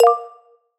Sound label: click